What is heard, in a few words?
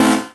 Synth Sound; VEE Synth Shots